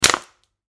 Water Bottle

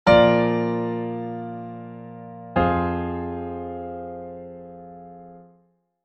Plagal cadence

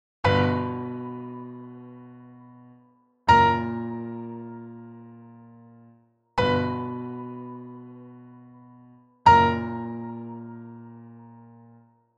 chorus hits

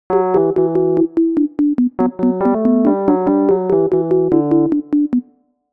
synth

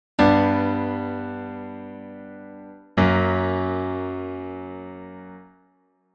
main chords

